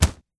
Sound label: slap